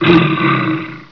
alert, grizzly; wanted

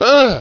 pain, miner; wanted

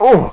pain; wanted

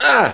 scream; wanted

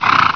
horse; wanted

